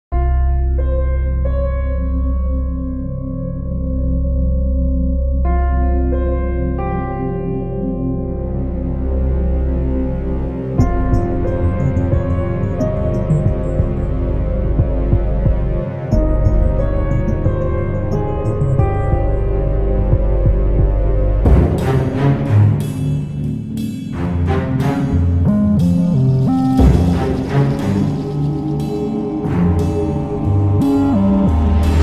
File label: mysterious music; موسیقی معمایی بی کلام